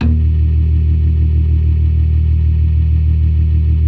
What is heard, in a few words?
ORGAN, 4 DRAWBARS; Keith Emerson Signature Series